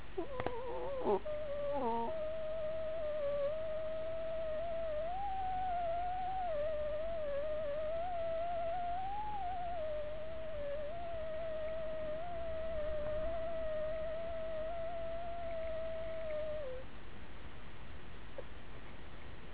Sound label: natural modulating tone; natural modulating sub vocal tone with embedded message